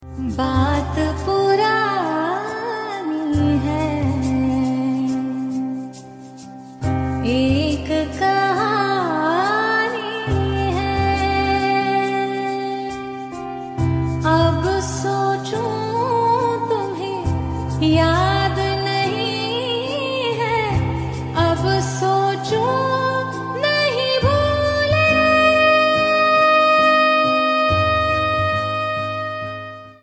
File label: Ringtone